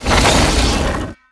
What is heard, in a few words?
spell ice force; magician